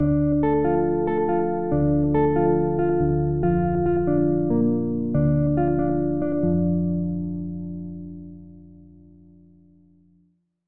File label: mel, BPM140, key D